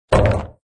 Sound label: Earth Spawn